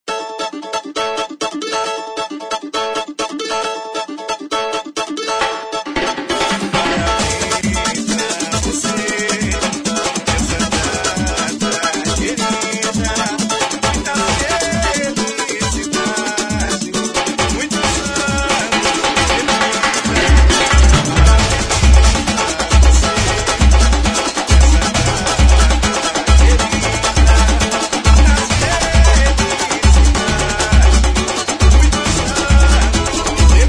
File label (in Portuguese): Parabens Pra Voce (Versao Samba); Sony